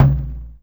Toms

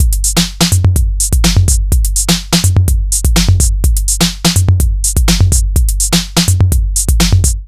125, Digital Breaks; Loopmasters; Jon Carter & Alex Blanco; Block Party Essentials

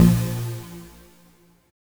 NOISY F2; Jack Sparrow Deep Dubstep Progressions

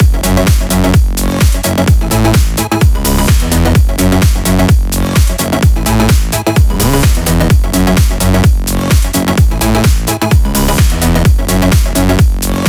Electro House Mix; Mutekki Media; Vengeance Electroshock Vol.2; Electro House Kit 1

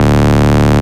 Bass Shot D

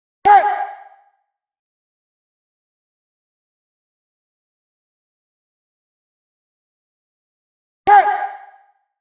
126 B#m Shout, electro synths; Prime Loops; Complex Electro Synths; Biomass

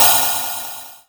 Ride, Dubstep One-Shot Drums

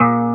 Bb STAB; Loopmasters; Funky Underground Vol 2

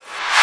FX Misc

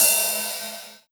Ride, Cymbals

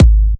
Dirty Dutch Bassdrum; Mutekki Media; Vengeance Electroshock Vol.2

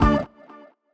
D STAB, Funky Underground; Loopmasters; Funky Underground Vol 2

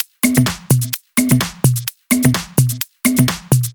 Loop, 128 BPM

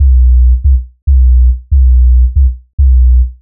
140 B BASSLINE, Dubstep

